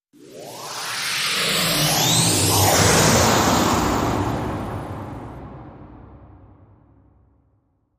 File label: Fly By Screaming Takeoff